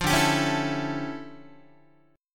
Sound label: D Minor Major 11th, down, Guitar; DmM11 strummed; JGuitar